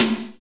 TOM, Dance

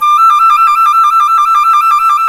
C flute trill

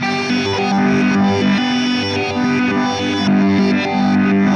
Reverse Guitar; Sonic Foundry (Sony Creative Software); Indie Rock Anthems; Session 02 - Clinical

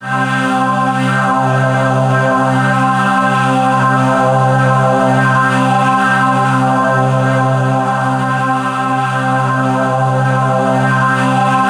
C3, Synth Leads

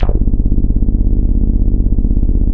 JV BASSIC; Syntec; Wall of Sounds

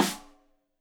Rim Shot Stereo; Deeply Sampled Drums; SMDrum

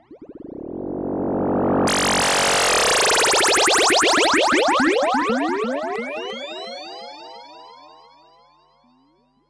Virus Drop; transition

